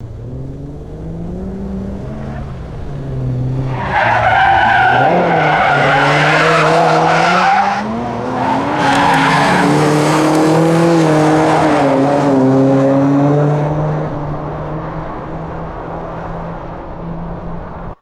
car accelerating and tires screeching while passing by